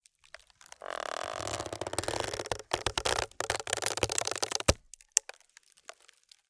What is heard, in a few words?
Wood Tree Creak Long; mono; Universal Sound FX